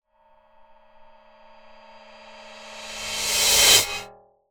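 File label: Reverse Metal Plate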